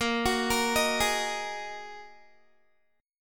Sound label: Bb 7th Suspended 4th Sharp 5th, Guitar; Bb7sus4#5 arpeggiated